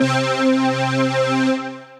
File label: Pad, Synth Pad; Illustra Pad